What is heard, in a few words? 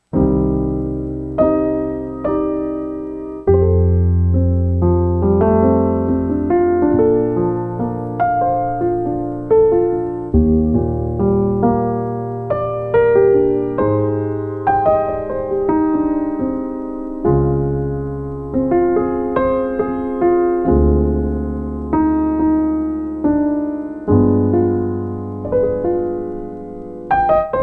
piano